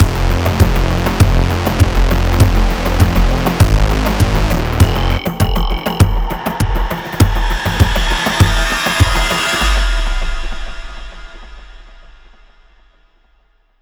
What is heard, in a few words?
MP Spawn General Short